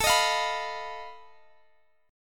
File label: B 7th Flat 5th A, guitar chord